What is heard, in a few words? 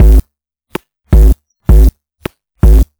Structured Rhythm, IDM Rhythms